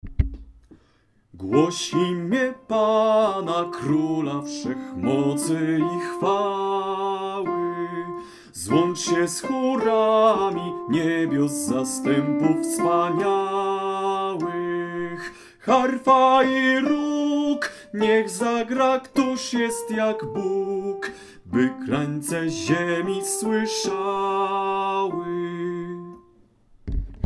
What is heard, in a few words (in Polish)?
sopran